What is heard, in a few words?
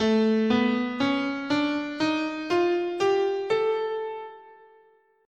A Major Locrian, scale